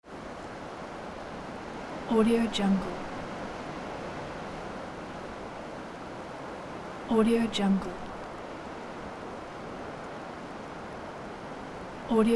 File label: snow wind winter forest